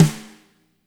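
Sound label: fat snare right; Spectrasonics; Burning Grooves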